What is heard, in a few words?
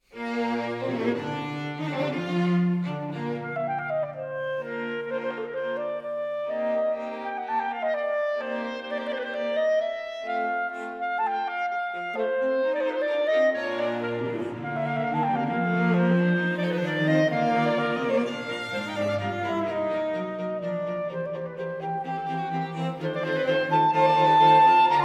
quintet